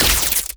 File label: red lightning medium